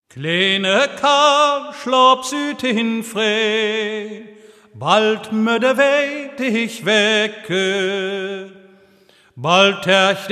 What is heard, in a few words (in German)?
klassiker wiegenlied